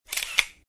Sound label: Shutter